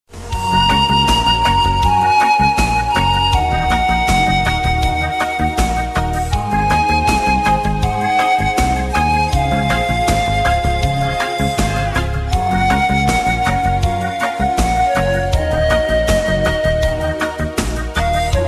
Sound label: ocarina moonlight reggae